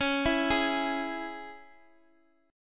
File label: C sharp Minor, Guitar Open G